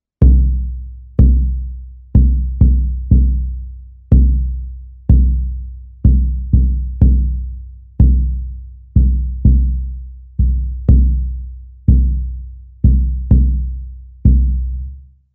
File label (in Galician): bombo